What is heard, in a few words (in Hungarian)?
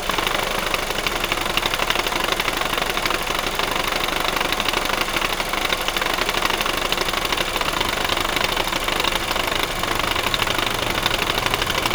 octavia csak zaj, dízelhangok; skodalatos dizelhangok a benzinesben